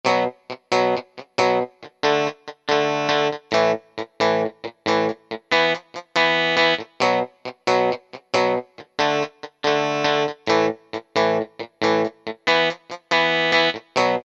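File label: Surf guitar; E-mu PK-6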